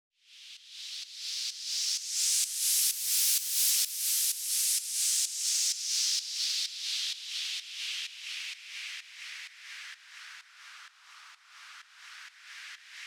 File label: 128 BPM Fx Noise; Vengeance; Essential Clubsounds Vol.5; Demo Constr Kits; Old College Kit; Root G